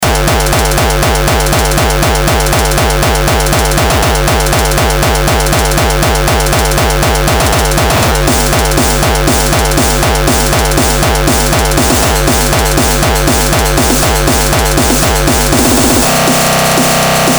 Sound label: Annoying Ringtone